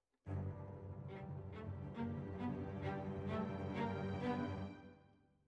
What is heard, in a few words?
Violas, jazz for piano